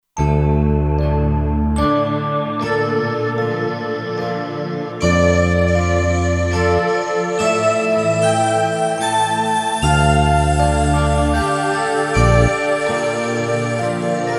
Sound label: Kurzweil K2500X; Factory Setups